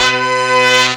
HORN LICK, Hits & Brass

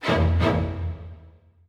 Orchestral Loops STRING ACCENT

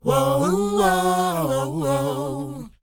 WHOA C#, Vocal, R&B & Dance; ILIO; Vocal Planet

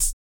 VOC PERC, BASS PERC

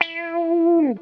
PLEEEZ, Funk Guitar; 90 s Sample CDs; Zero G

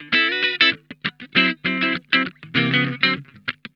SLINKIN, Funk Guitar; Zero G - Funk Guitar

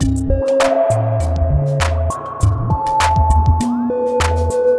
Robo Beat 100BPM, sci-fi Beats

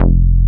TEK F2, TEK BASS; Drums In Your FACE!

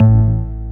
BASS, SYN BS; AKAI S6000 CD-ROM - Volume 1; SAMPLE WAVE MIXING 1

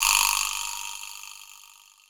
EQ Perc, Percussion Mix

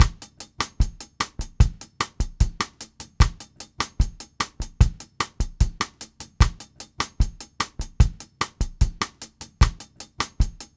Bassa Nova, Latin Percussion, Drumset; Sonic Foundry (Sony Creative Software); Joe Vitale Latin Percussion

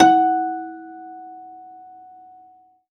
Rubbery Piano, prepared piano; Total Piano; Rubbery Piano 2 -ped

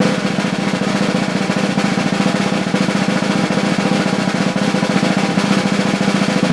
snare, Roland; LA Composer

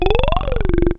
SYN F#2; Best Service Gigapack 1

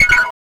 CYBER KIT, DRUMS

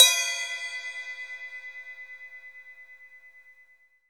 hat, Hi-Hats; Roland L-CDX-01; HH Modules

